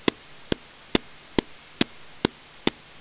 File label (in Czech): HODINY; C kralik